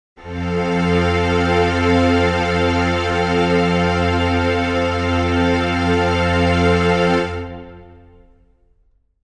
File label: string chord f major